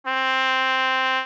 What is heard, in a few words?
trumpet